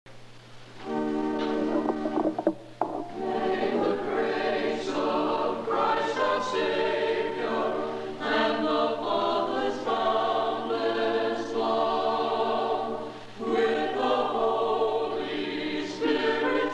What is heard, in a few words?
Choral response; May the Grace